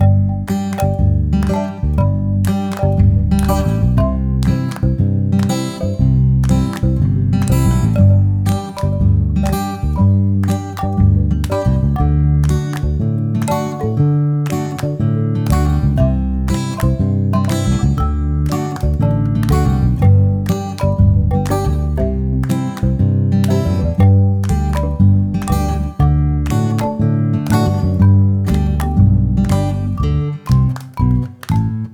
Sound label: payoff music; Kung Fu Chaos; XBOX